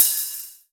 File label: HH THIN OP, Akai MPC2000